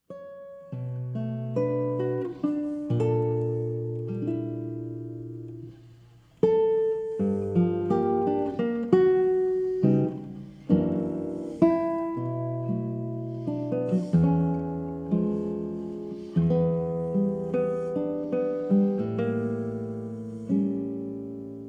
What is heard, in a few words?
violao violao